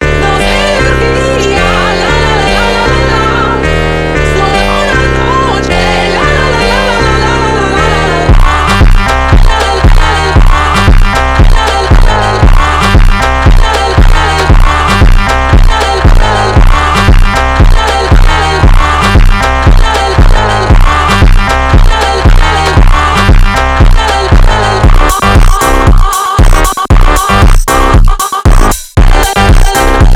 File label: PHONK GROOVE